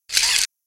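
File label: Mechanism Fast Metal, servos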